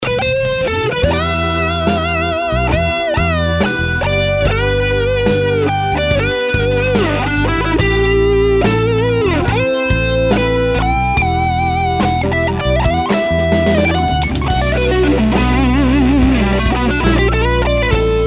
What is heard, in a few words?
solo